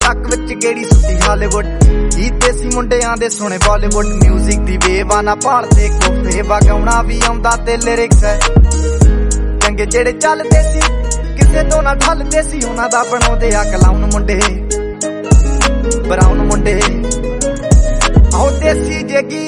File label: punjabi song tone; nokia ringtones